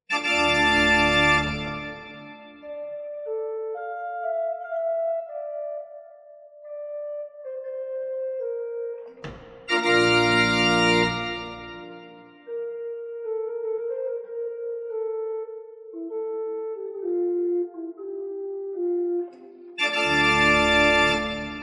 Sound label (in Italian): Corno di tuba dolce